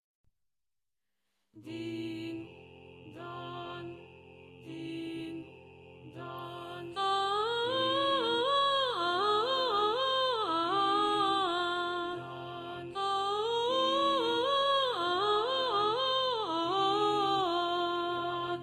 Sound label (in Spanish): las campanas sop